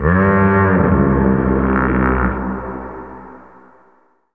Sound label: foghorn; Digital Sound Gallery - Volume 1; animate